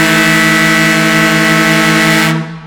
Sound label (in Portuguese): buzina